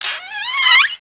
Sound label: SHUTTER